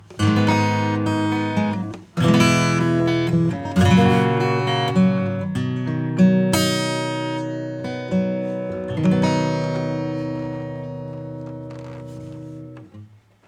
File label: acoustic EQ